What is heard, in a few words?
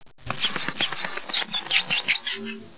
scaird bat